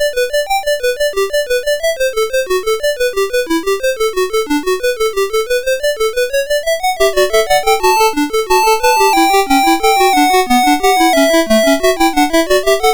Fughetta, MOS6581R2

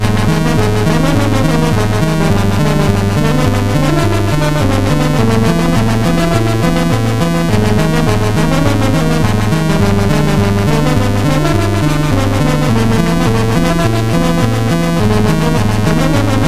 sid MOS6581R4, GAMES; Frenzy

